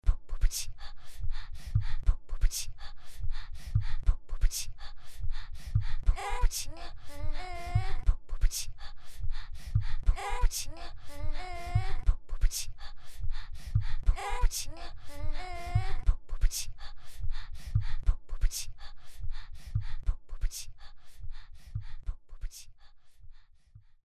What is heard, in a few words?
Beatbox